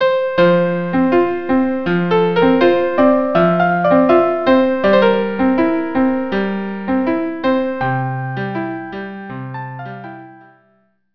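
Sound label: viola duo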